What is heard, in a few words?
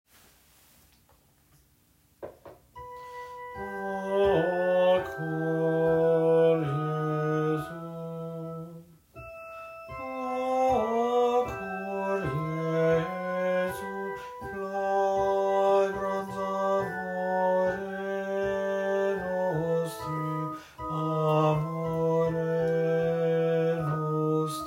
o cor jesu alto